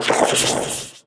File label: Ninja Death